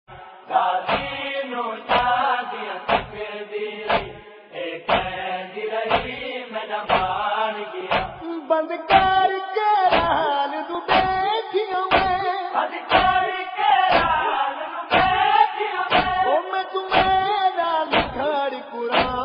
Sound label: noha tune ringtone